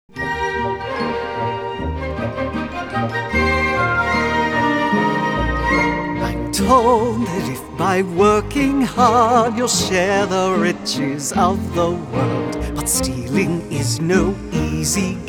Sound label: singing